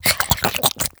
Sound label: attack slobber, headcrab, creatures; headcrab classic; vox